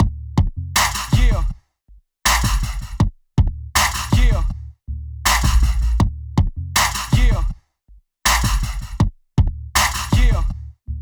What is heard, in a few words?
Heavy Weight, free loops